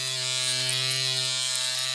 Razor Loop

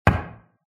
knock; Five Nights at Ronald's

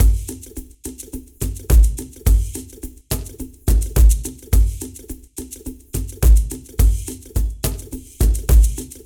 Night Attack; Beefense BeeMastered; Beefense Night Attack1

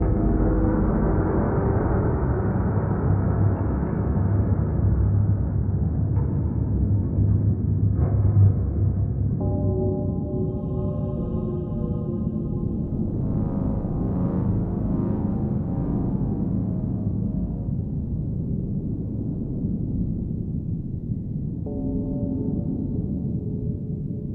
Ambience

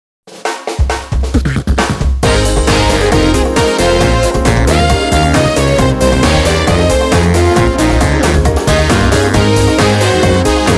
Music Success event

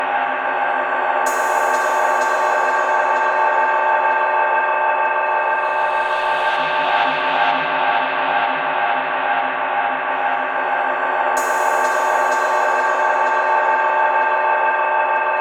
synthy ambient

